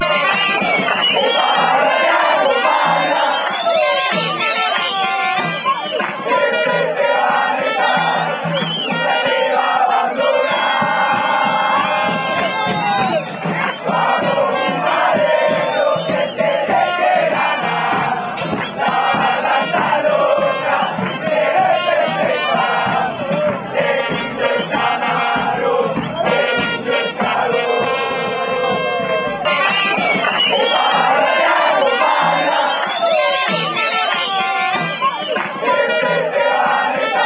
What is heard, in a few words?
en dos bocas fui campeon, Paraguay